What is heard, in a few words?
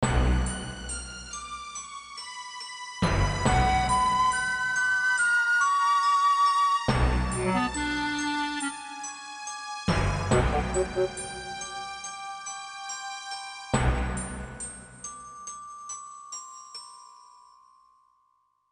Background Music; little big adventure 2 twinsen s odyssey original soundtrack